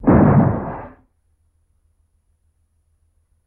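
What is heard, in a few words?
Missile Impact Explosion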